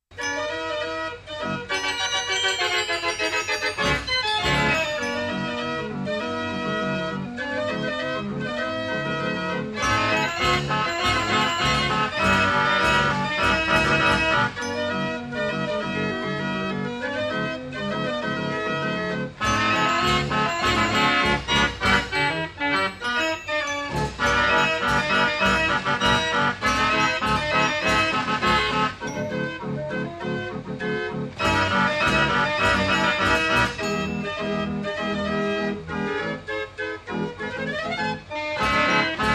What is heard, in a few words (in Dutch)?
kermisorgel; de witte wellershaus